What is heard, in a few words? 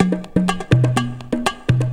CONGA LOOP, Perc Loops, Techno Trance